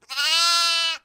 bah; Devour

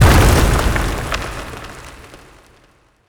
environment rocks falling fall

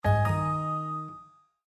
Cityscape Exclamation, windows 7

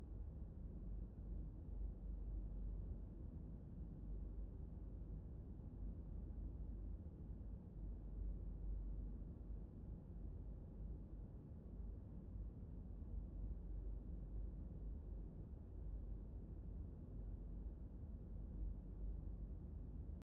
asylum altar ambience

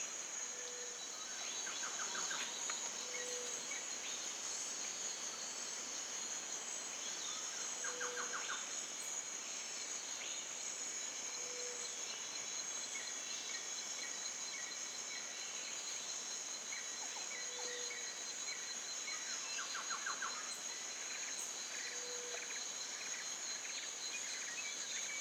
Ambience - day tropics; Sim City Societies

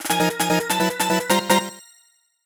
Jingle Feeding Frenzy; StreetPass Mii Plaza; Ultimate Angler

